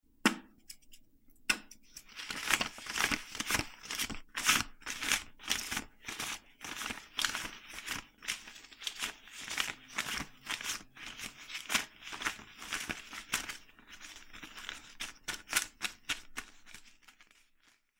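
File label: money banknotes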